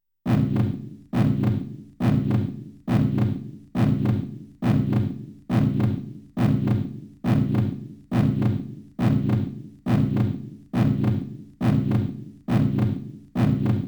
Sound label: Heartbeat; Samurai Shodown Samurai Spirits original soundtrack; Shiro Tokisada Amakusa 1